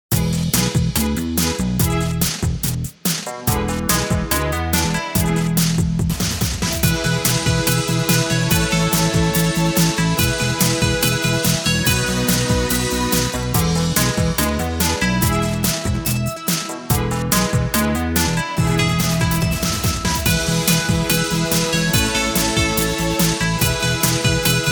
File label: birthday karaoke